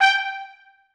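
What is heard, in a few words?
trumpets staccato g5; Sonatina Symphonic Orchestra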